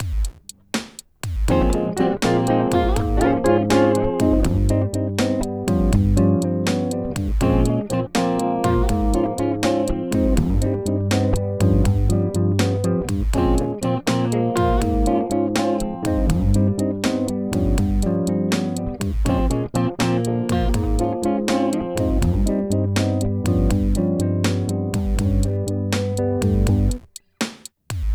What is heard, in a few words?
guitar test